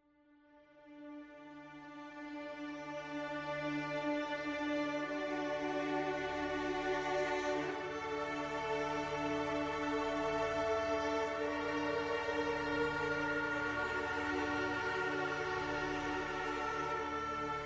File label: ambient